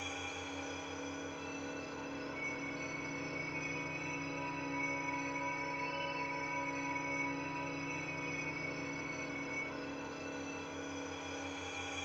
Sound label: tonal